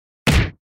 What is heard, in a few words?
hit